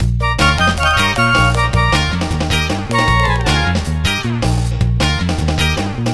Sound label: Flutes & Swingers 1 Full A#, Lounge; Zero-G Kocktail Kollection; Construction Kitz; Flutes & Swingers 156; 90 s Sample CDs